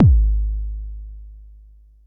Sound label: Bassdrum, Jomox Xbase09